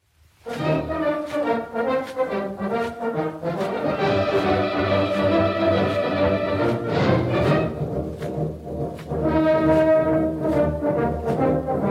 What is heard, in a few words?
Concert Band, Funiculi Funicula